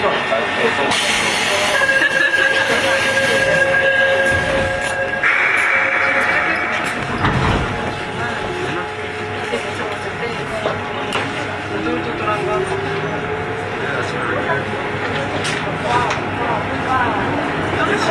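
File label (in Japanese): 走行音, 2号線, ソウル地下鉄; Seoul; 住十里(Wangsimni)-漢陽大(Hanyang Univ.)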